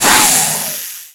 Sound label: attack, snake boss; 12zi snake boss